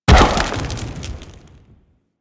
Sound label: Bullet Jump Fire; Parkour; Mods